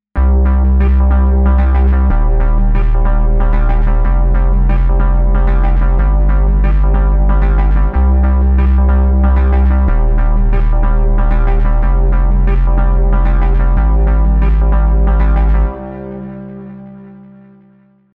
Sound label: digitone